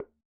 Bongo Hi Slp; Percussion

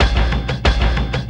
Cym Drum, Drums, Frame, Kendang; Short; Sonic Foundry (Sony Creative Software); Friendly Fire

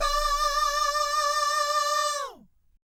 VIB FX, Vocal Planet Jazz & FX; ILIO; JAZZCOMBO

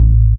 DR. LOOP, BASS; X-Static Goldmine 3

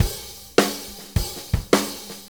Blues Shuffle, Drum Loops

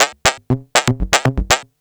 Arp 2600, synths

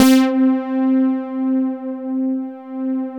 TS 10, Analog, Synthesizers; Soli-Music; World of Synthesizers VOL-1

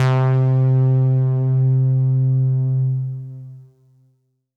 Synth C, Synth Prophet One Shots; Electronisounds

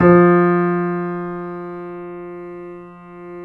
C7; East West; The Ultimate Piano Collection

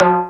DMX Timbale Hi, Oberheim DMX

